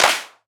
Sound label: Clap Hit Hard; One Shots; Drums; Ableton Live 12 Lite; Core Library